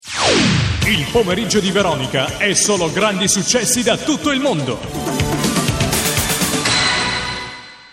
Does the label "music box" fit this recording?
Yes